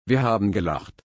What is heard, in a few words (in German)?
lachen